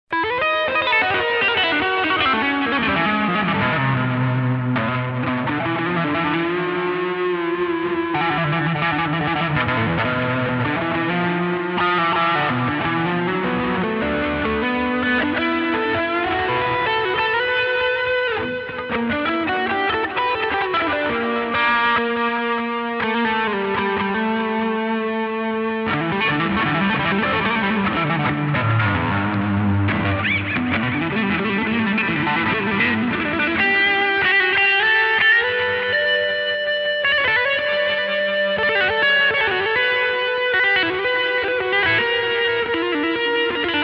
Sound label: solo